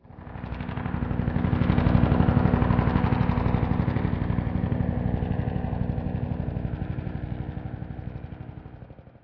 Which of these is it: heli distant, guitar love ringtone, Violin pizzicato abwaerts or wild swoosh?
heli distant